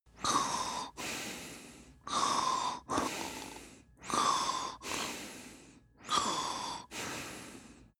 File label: male sleep breathe pursy; koerpergeraeusche; menschen; soundpool